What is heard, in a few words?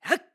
Attack; BOTW; Breath of the Wild; Link